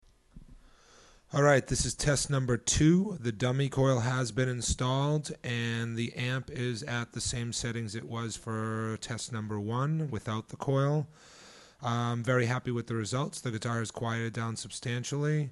dummy coil on

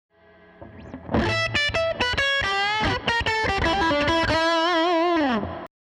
Hum Blade Bridge Gain

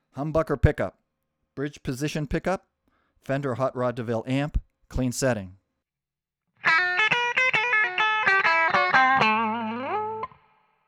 hum bridge hotrod clean

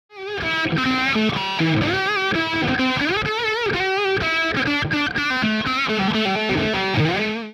USA tele

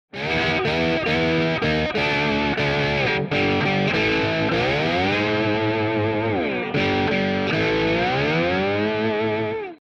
P90 soap slide

